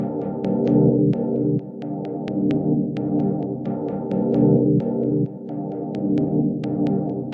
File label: 140 down to the blue stutter 7th; SYNTH AND ELECTRONIC LOOPS